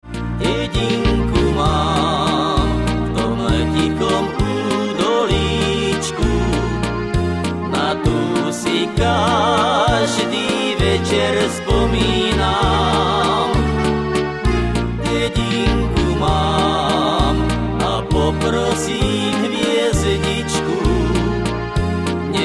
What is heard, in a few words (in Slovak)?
akord